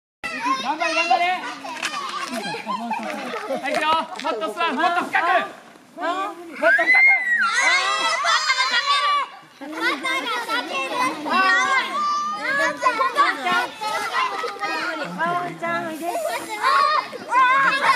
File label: Sounds of Yufuin Christmas Camp